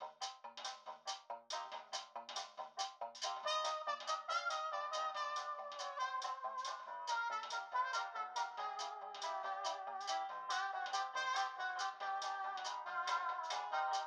Whispering Radio; To Hell with the Ugly

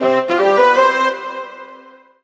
MUSIC EFFECT Orchestral Brass Positive; startide macos switch windows gamerip 2017

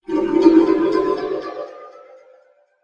Pipe Filling

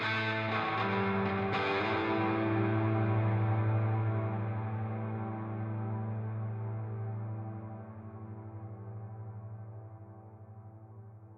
Amb Guitar; Worms Rumble; PS4 PS5 Switch Windows Xbox One Xbox Series XS

